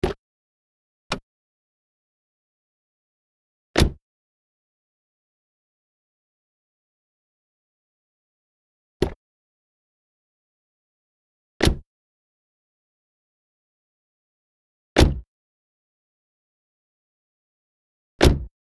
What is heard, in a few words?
Car Boot And Bonnet